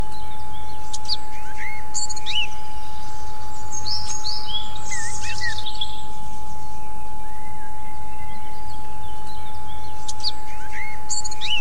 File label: Return to spiritual order with forest sounds